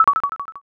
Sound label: othello